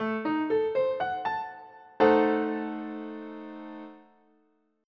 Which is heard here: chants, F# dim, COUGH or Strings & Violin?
F# dim